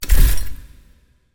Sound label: decision, button